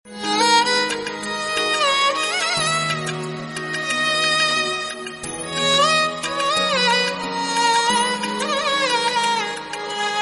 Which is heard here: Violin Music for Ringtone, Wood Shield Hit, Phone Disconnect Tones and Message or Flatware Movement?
Violin Music for Ringtone